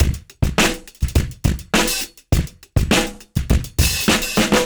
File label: fx, Beat Loops, 103; Zero-G; Monster Beats